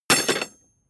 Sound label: metal tools drop; soundpool